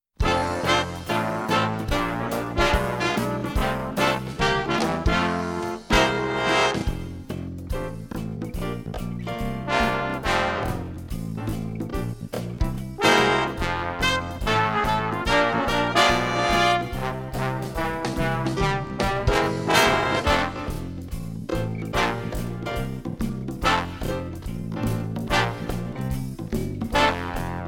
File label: accomp slowed